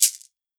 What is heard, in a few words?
MIXED PERC; 90 s Sample CDs